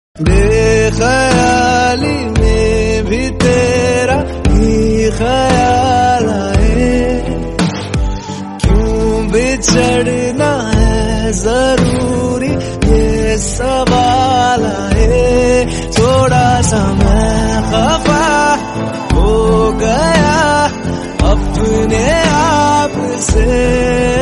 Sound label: Hindi song tone ringtone; bollywood ringtones